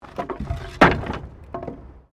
Wood Move; Material components for constructing more complex sounds; Wood (Sound Effects:Materials)

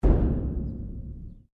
Strike Metal Hollow Tank Soft; Materials

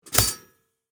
Toaster Up, Household, Toaster, Up; Appliance Sound Effects; SoundEffects-BlastWave

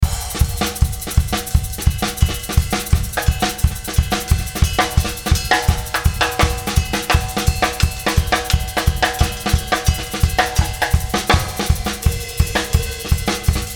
Beat Loop Salsa Shaker Latin Drum Groove

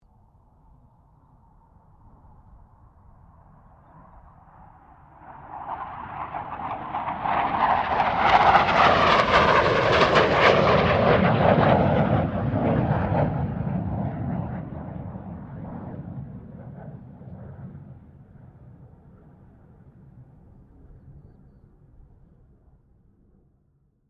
AIRCRAFT CA-27 SABRE PASS; F-86 Sabre; Airplanes; Vehicles

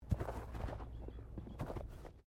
Body movement Foot Foley

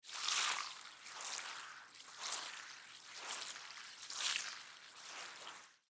Movement Clean Up, Clean Up Puddle Wash Cloth; Water Movement Clean Up Puddle Wash Cloth; Cleaning; Household; Blastwave

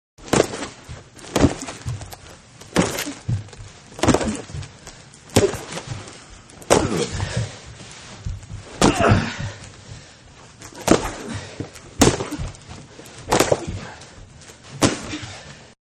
Football Blocking continuous; Sports; Manhattan Production Music

